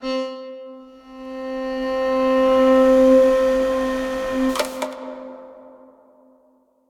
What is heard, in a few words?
MPE Bowed Zither; Strung Together